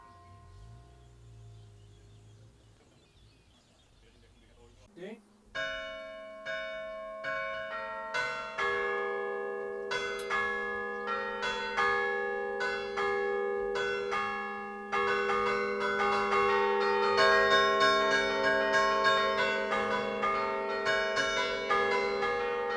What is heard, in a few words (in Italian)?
Campane, Rocchetta Vara; La Spezia; Liguria; Archivio Balma Campane Liguria; Polifonia